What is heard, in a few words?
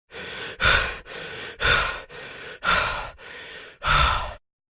Panting Male Sound Effect